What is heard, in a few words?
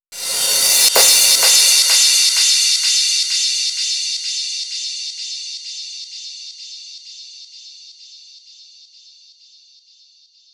Female Crash Mix, 128 BPM; Vengeance Samples; Vocal-Hook Kits; Song Kit; Freaky Boys